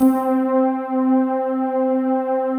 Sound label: PAD; Best Service; XX Large; Pads